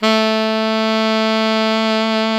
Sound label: SAX ALTO MF; Alto Tube